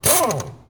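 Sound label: Impact small, air tools, industrial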